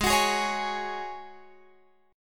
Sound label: Ab 7th Suspended 4th Ab Guitar Open F; strummed; x,15,18,15,16,15; down